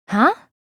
"huh"; celestia

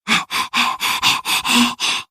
akane drooling

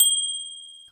metal, triangle ding

